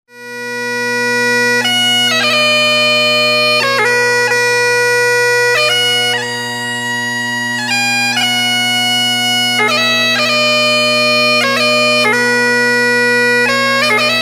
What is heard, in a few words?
My Home bagpipes